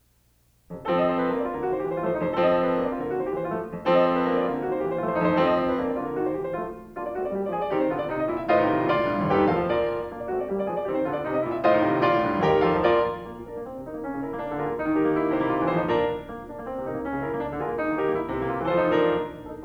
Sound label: Carnaval for piano; Schumann; Op9